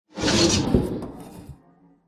Take Items

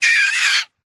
Shriek

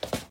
skip walk

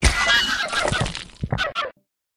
Baboon Kill; Lethal Company

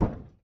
Aluminum

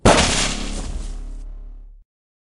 Kill Player Spring; Lethal Company

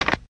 Boombox Stop

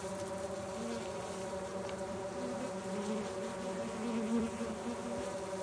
BeeHive Idle; Lethal Company

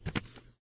Grab Clipboard

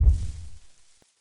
Distant Rumble; Lethal Company

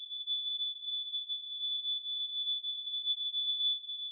Ears Ringing

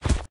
Footstep

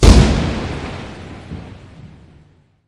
lightning strike

